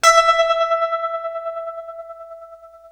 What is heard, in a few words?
TREM E 4, Guitar Samples, TREMELO; East West; Steve Stevens Guitar Samples Collection